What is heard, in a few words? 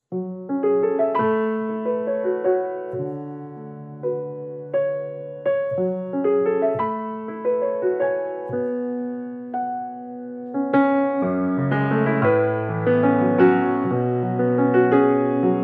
Piano